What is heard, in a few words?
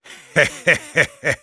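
laugh evil, German; Böses lachen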